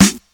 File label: 00s good steel snare drum g key; snare drum samples